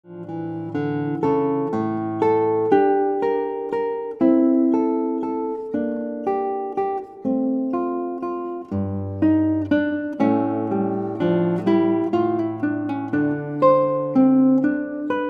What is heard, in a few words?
Guitar